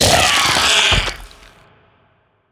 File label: worm death small, creatures; Factorio